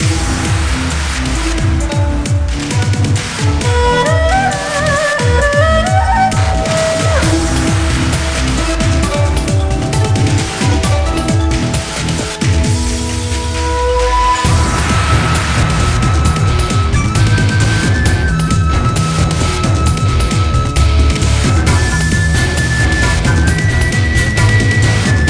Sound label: Dojo Music